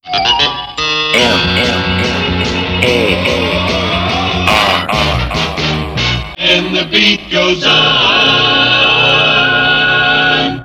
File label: Jingles; MAR; MAR Jack